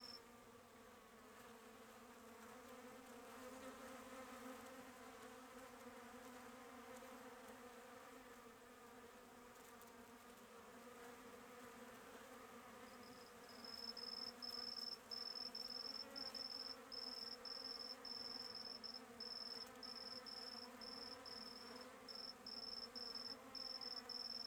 Abelhas ponilizando e grilos

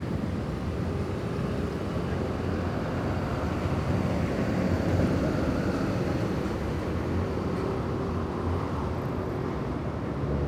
Praca civica perto da rua rush inicio da noite com um bem te vi, alguem se; transito

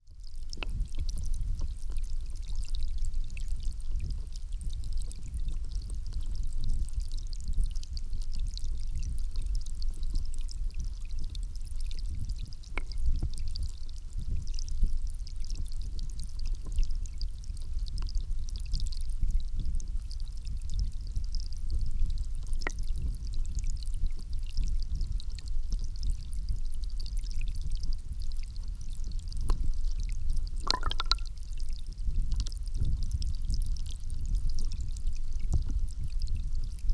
Fio Dagua Captado com Hidrofone

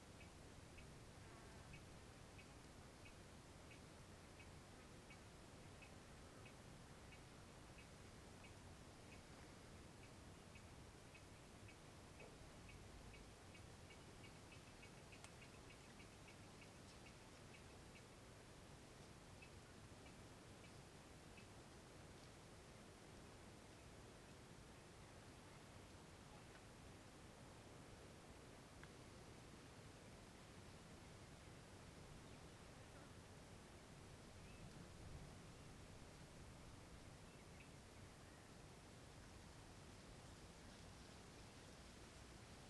Trilha caminho ao Parque Nacional; da Nacional; ambientes naturais; canais surround 5 1

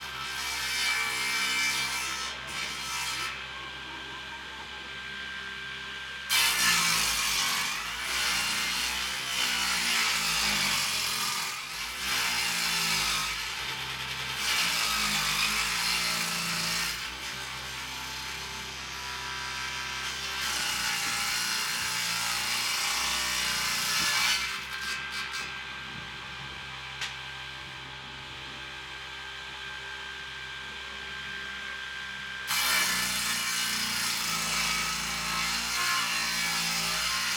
Serra em ambiente interno com pessoas longe; maquinas; canais stereo

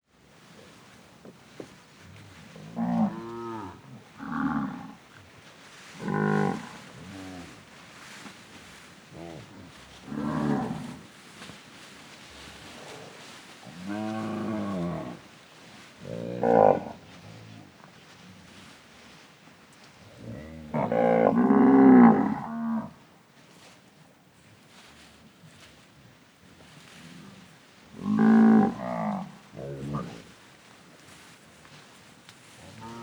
Gado pastando grama mugidos; animais; Coleção Sonora do Cerrado

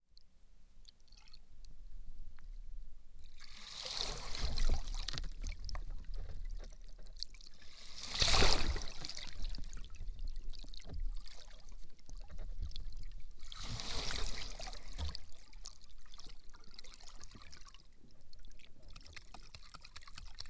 Movimentos com Hidrofone Dentro Dagua Gerando Texturas Sonoras; Cerrado

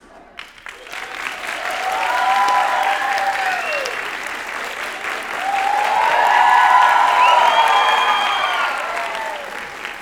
Pessoas ovacionando duas salva de palmas com assovio; a primeira mais longa; multidao

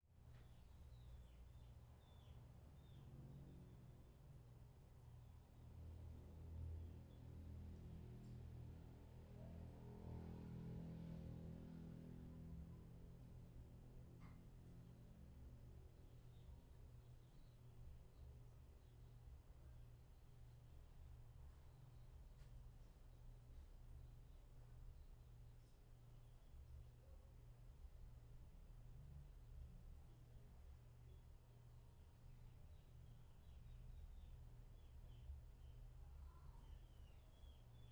Ambiente em Sala de Estar no Paranoa Pela Tarde com Passaros ao fundo; moto; ambiencia domestica

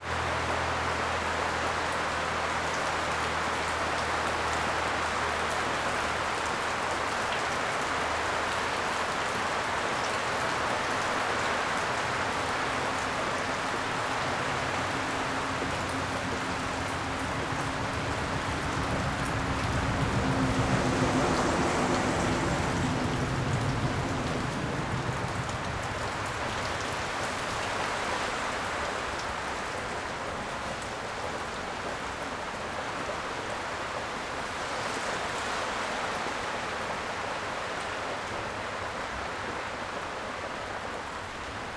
Chuva forte som grave

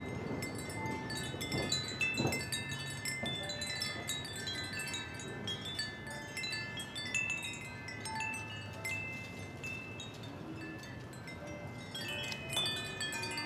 Sino dos ventos em loja com vento forte, transito, radio tocando no fundo

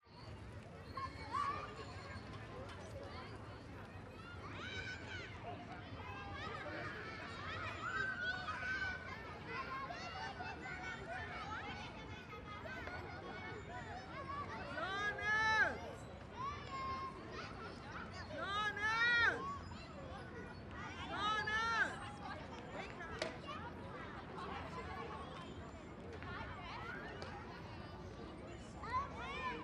Criancas Bricando e Gritando em Parquinho de Areia; multidao

